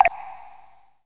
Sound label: Drips Reverb; Playable Tutorial Level; TR2